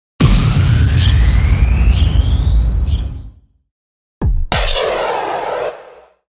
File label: Activate Burnout